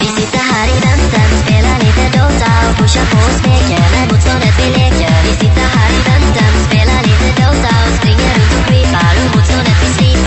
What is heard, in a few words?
random loop